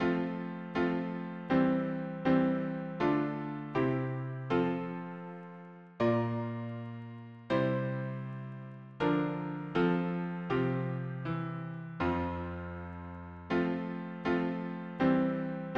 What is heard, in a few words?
harmony piano